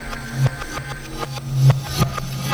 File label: Ambi Groove